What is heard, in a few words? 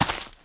step ground; people; Pirates Of The Caribbean